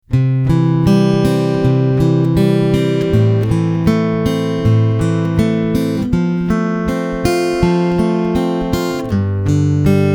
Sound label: odd fingerpick, acoustic guitar